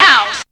VOX

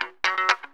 GTR RIF; E-Lab; X Static Goldmine 2; akai

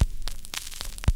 CRACK, CRACKL FX

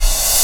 FX SUCK; E-Lab; X Static Goldmine 2; akai

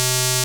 TECHNO; E-Lab; X Static Goldmine 1

